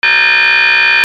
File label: ALARMS